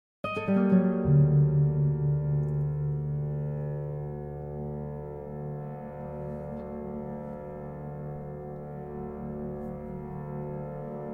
five pieces for guitar with live electronics; II. lachrymal

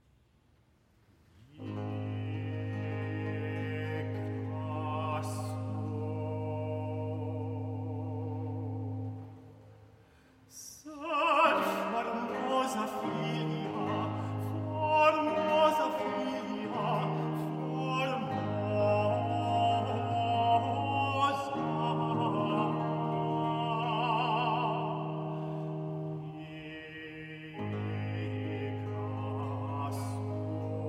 motet for one voice; Vespers of 1610; Nigra sum

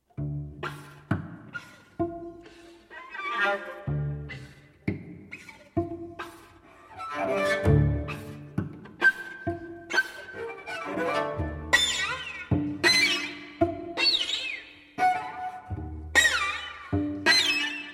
string quartet

